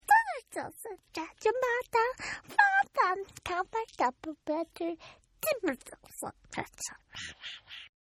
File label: Baby Gibberish; Talking; Sound Effects:Voice; Partners In Rhyme